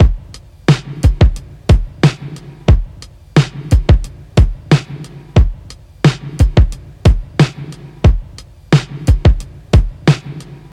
90 bpm drum beat e key, Free drum groove - kick tuned to the E note. Loudest frequency: 487Hz